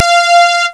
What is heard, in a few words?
trumpet